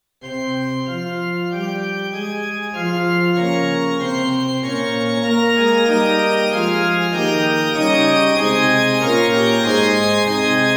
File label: Organ, Tonality：f (♭♭♭♭) Tempo：Quarter note = 96